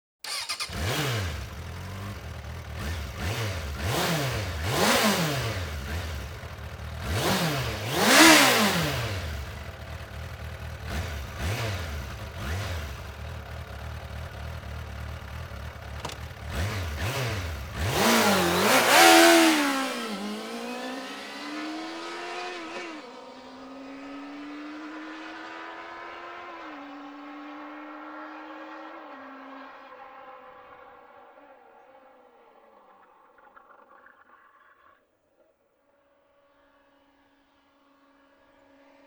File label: Suzuki GSX 600 F, MC Lyde